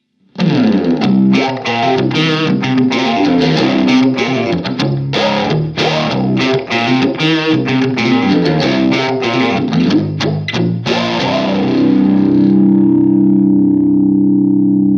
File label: Vowel Movement wet; Twangstrom; u-he